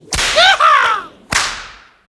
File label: Yeehaw; Orphaned